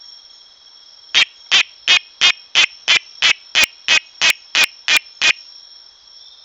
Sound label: Oreophryne aurora